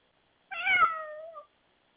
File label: cat meow